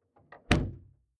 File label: car door close exterior hummer h3; Cars